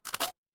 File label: cartoon chomp